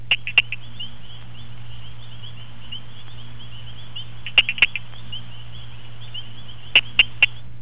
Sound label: tinker reed frog